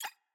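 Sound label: ui fanfare dialogue next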